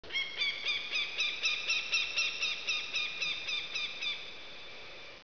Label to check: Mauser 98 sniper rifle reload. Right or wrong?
Wrong